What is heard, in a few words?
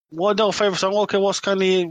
Gibberish